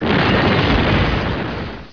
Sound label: hit target, explosions; xshipwars